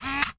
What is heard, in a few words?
technology camera compact lens whir; canon elph lt 260; zapsplat